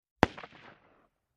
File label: Mini 14 Machine; Rugar